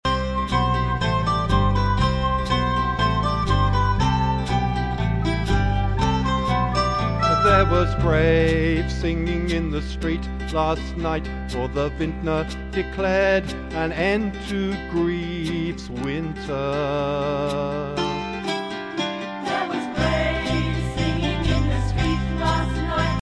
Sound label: singing